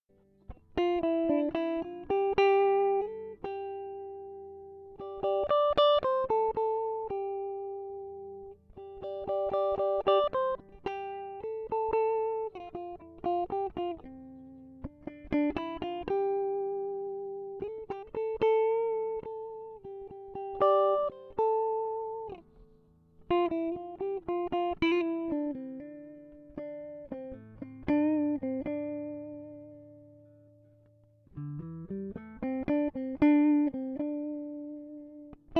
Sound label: Snow riffs